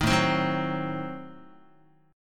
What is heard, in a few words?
A Diminished 7th A down Guitar; Adim7 strummed; JGuitar